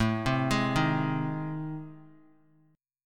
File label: A Minor Major 7th Flat 5th, Guitar; arpeggiated; AmM7b5; JGuitar